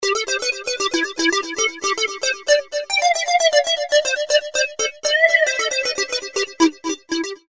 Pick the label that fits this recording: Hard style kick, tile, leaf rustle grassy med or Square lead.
Square lead